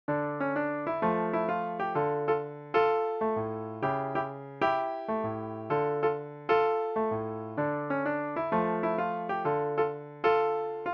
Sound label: joshua fought the battle piano